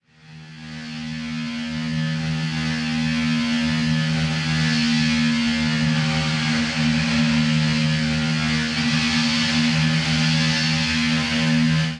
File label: Razor Wire D# 80 Bpm; MIDI Clips; Instruments; Ableton; Drone Lab